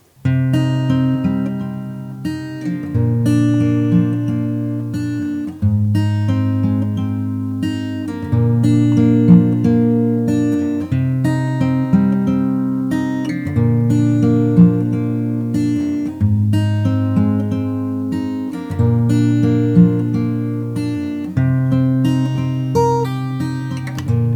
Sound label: Fingerstyle Freedom Ear Study Exercise, guitar